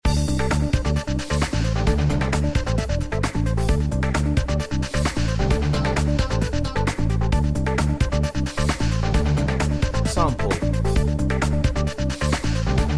intense action

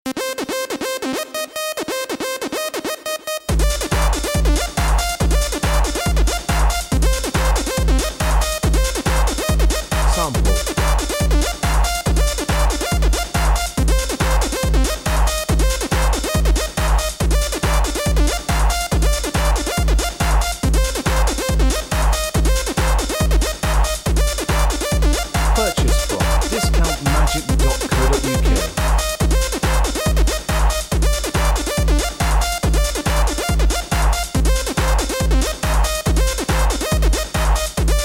techno, backing

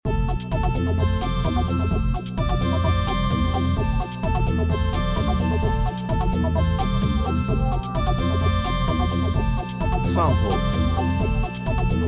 disco

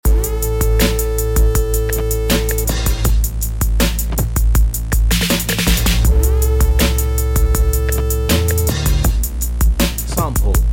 down drums remix

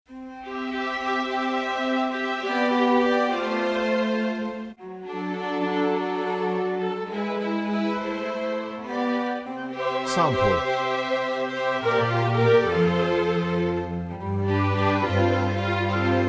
classical

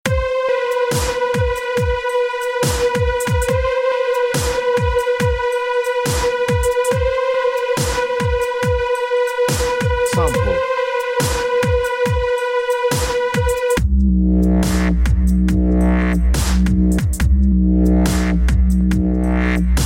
dubstep, backing

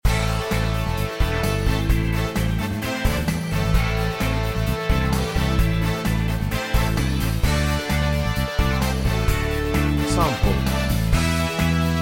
high tempo

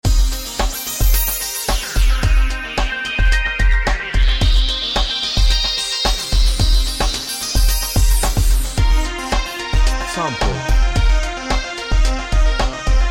beat

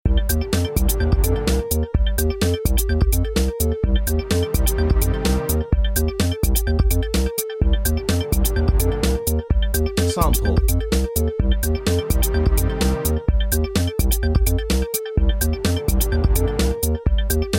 electro, backing

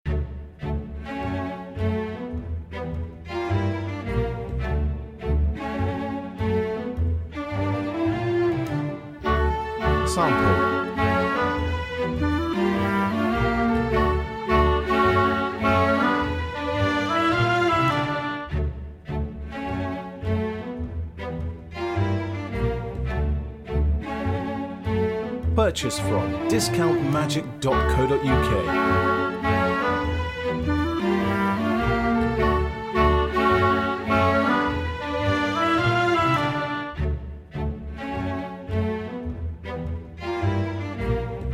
classical

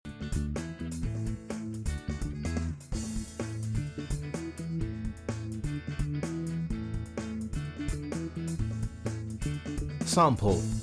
Upbeat Groove

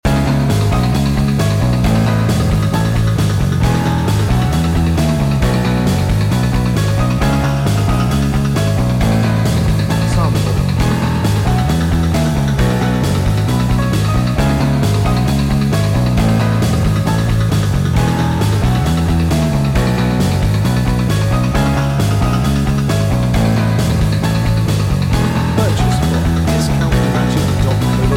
mod piano

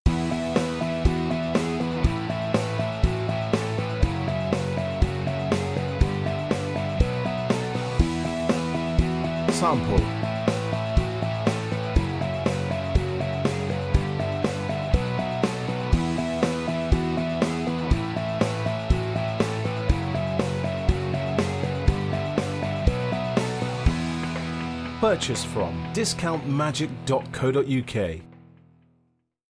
pop

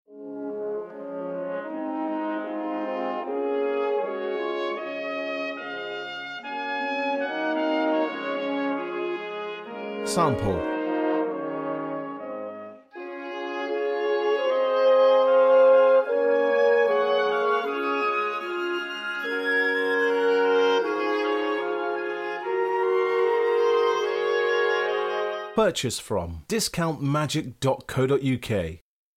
beat

